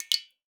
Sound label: Drips